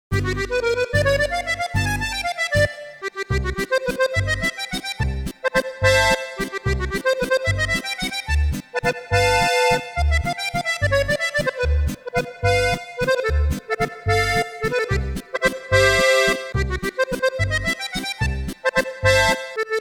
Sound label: VALSE